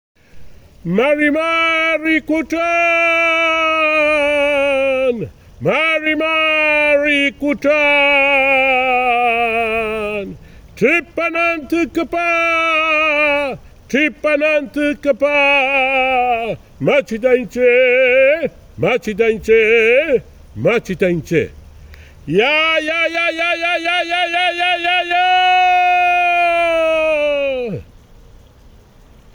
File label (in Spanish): muestra de canto; quilapi sonidos ancestrales